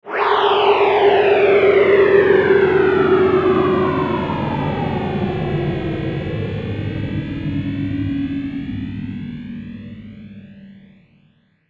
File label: Sweeper Electronic Flange; Production Element Imaging Element Accent Transition; Electrical Sound Effects